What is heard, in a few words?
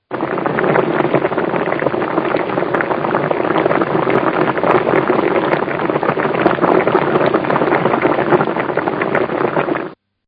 BOIL, food prep; Restaurants; Partners In Rhyme